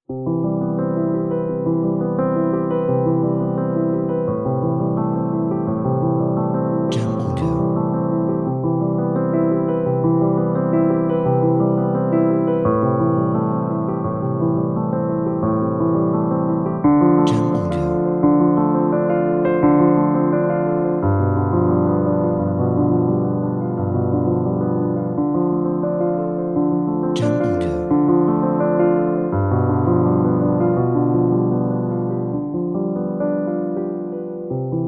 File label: Soft Piano Background